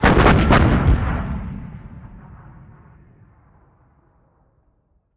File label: 105mm Howitzer: Fires, Close-up. Dual